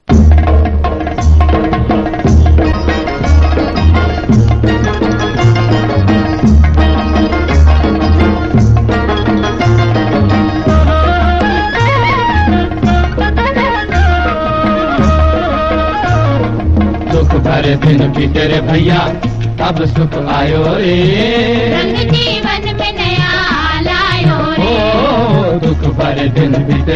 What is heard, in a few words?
old classical